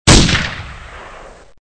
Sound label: rifle; Gun Mayhem 2